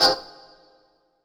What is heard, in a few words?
Dance Mega Synth; Best Service; 90 s Sample CDs